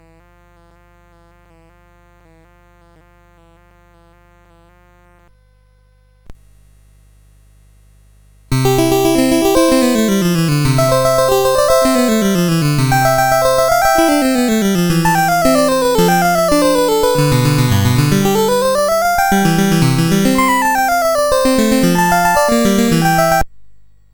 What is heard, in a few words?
Prelude, mus CSG8580R5; Anon Blank; Croft